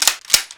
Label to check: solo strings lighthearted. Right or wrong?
Wrong